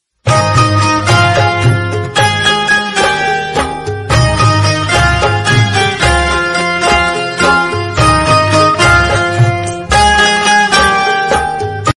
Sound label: chhath instrumental ringtone chhath instrumental song chhath puja ringtone